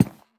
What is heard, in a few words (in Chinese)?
樱花木悬挂告示牌踩踏声